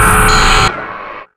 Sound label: turret alert; Quake 4 Alpha; weapons secturret